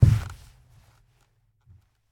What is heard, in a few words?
Body Wall Hit; Game rip; MiSide